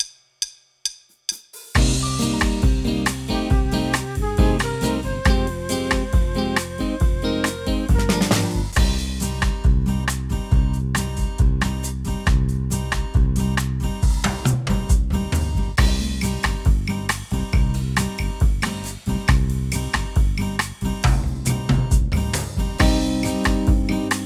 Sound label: BOSSA